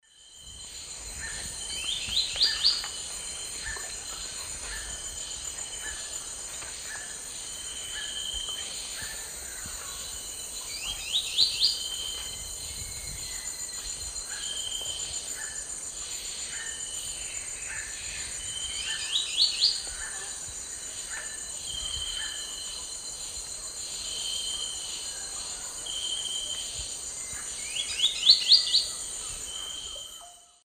Glyphorynchus spirurus, song; S. America; Eastern Lowlands

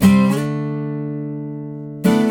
Guitar D, Acoustic

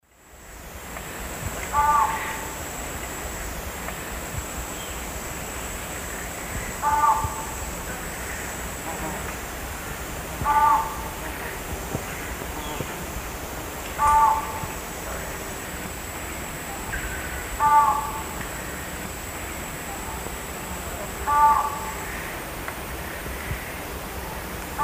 Electron platyrhynchum, song; S. America; Eastern Lowlands

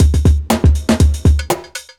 chemical 120, loops, 120bpm

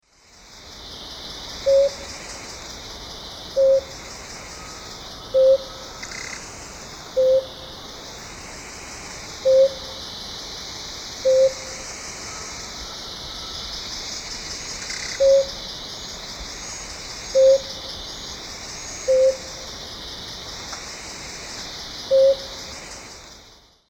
Claravis pretiosa, song; S. America; Eastern Lowlands

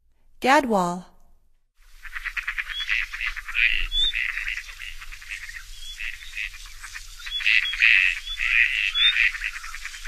Gadwall; North America; Stokes

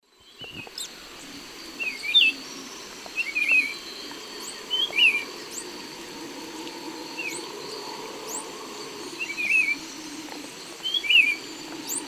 Saltator maximus, song; S. America; Eastern Lowlands

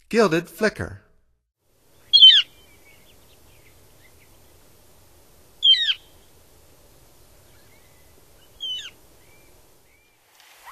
Gilded Flicker; North America; Stokes

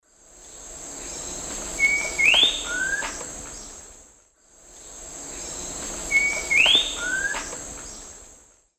Icterus croconotus, song; S. America; Eastern Lowlands

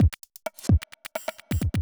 electro kit 130

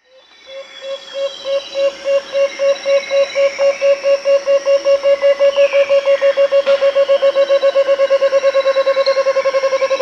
Striated Antthrush (Chamaeza nobilis), Peru; S. America

